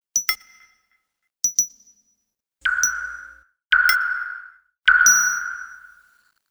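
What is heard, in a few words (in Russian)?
звук нажатия кнопки мультимедиа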